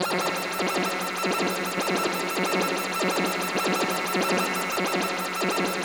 electric, special FX twisted; USB Soundscan; AKAI

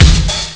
hi snare; Amiga Soundtracker Sample Packs

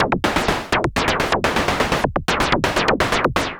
SH101 Clocked LFO Rhythm (125BPM)

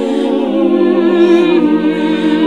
SING, CLASSIC VOX; Best Service; Hallelujah

